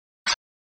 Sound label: Magic Spell Chimes; HB03 - Hanna Barbera